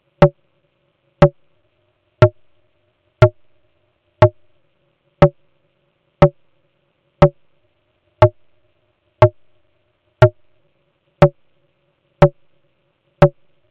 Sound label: Moogerfooger Drum Snare Ringmod